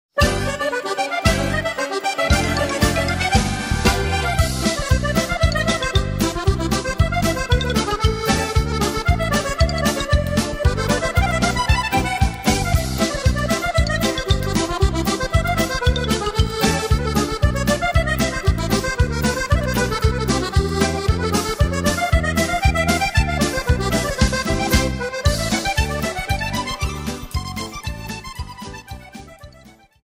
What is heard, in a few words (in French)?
polka